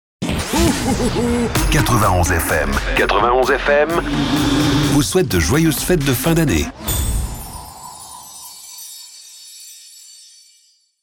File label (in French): Jingle Ambiance fetes